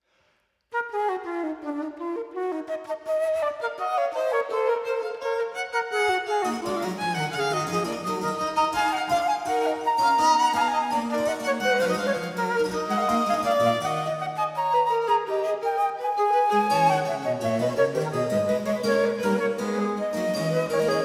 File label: Sonata e-moll Fugue; Hotteterre; op 3 No 4 movement 2